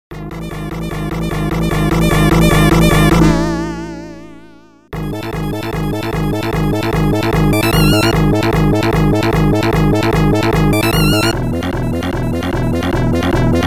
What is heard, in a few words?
boss bgm